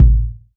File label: verby bass drum sample g sharp key, kick single hit tuned to the G# note